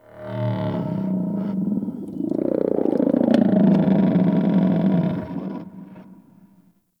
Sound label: Creature Growl; Warframe; Tau